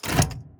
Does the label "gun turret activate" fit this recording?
Yes